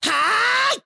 Attack

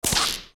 Fishing Rod Set; Setting the hook

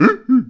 Moblin Find Weapon

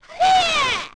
Blast; OOT

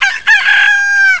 Cucco; Zelda

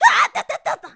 Hot Cold; Link hit by hot steam or cold water, or sliding on ice; Zelda

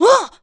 Ledge Fall

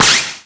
Bow Shoot; Shooting an arrow; Toon Link; Zelda

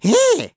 Sneer; Rupin sneering; Skyward Sword; zelda

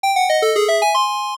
Secret Mono; zelda; OOT

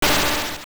Boss Shoot; Various "Shoot" sounds from bosses; Zelda Oracle

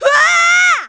Scream; Link screaming (when Aryll is kidnapped); zelda sounds

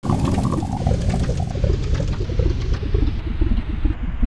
King Dodongo Lava

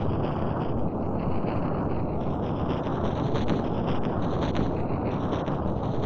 Fire Trap Loop; Fire Temple; Zelda; OOT

